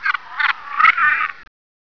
maggot idle

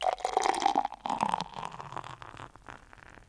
soda

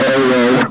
pain, military dog

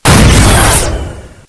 skill fire; nobita

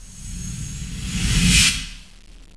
chem air

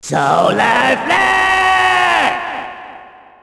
solar flare

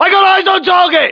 alert; fgrunt

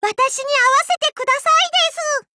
reloading

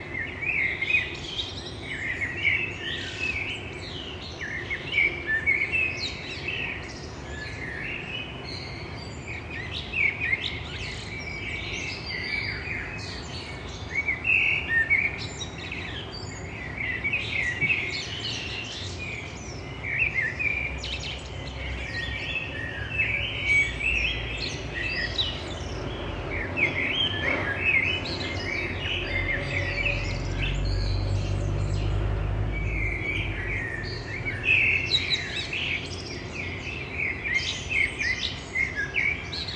birds morning

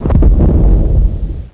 distant mortar

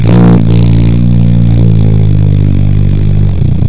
flames run; Quark RB; fartasour

